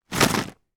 skittles sweets shake in packet

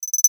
multimedia rollover; sound effects one

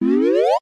cartoon ascend rise short slide; zapsplat

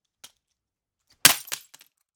Mobile phone crash; foley walkers

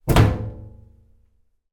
impact metal boiler panel

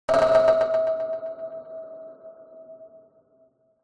sound design hit pipe hollow metal echo designed; zapsplat

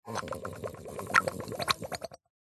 cartoon slime bubble up; zapsplat

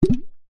bubble pop

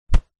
clothing thick jacket punch sweetener; zapsplat

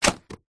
household book telephone directory set down; zapsplat

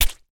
horror stab knife flesh wet squelchy; sound effects one

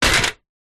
industrial step ladder metal set down on side

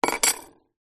medal metal set down on table; foley; zapsplat

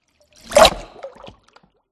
Cinematic Water Whoosh

